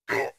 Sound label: Big damaged; RedDot